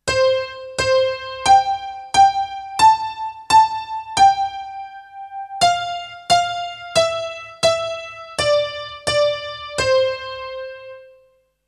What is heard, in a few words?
honky tonk